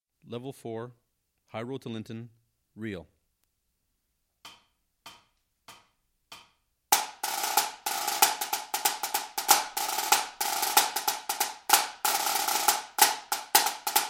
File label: SNARE; High Road to Linton